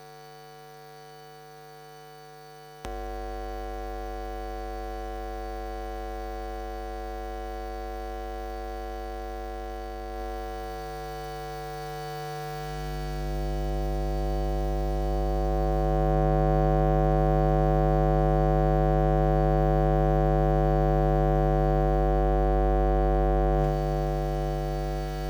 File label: Electromagnetic Field, Electro; electric fields